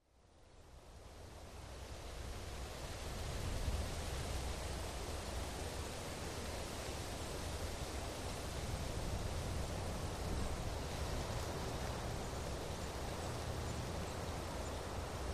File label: Rural Ambience Light, trees & gusts